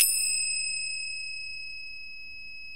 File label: PERC & KALIMBA